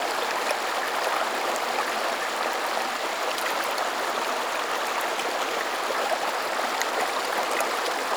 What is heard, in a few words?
Bubbling Water Stereo Loop; Ambience; Props; Warframe; Nineteen Ninety Nine